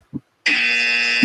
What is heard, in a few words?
incorrect buzzer